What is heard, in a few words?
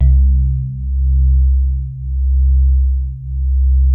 SWEETER C1, E-PIANO; Music Store - Claudius Diemeter Schwerlos